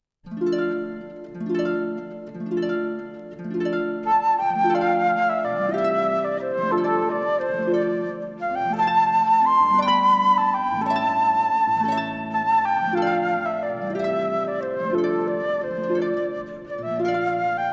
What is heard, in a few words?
old english air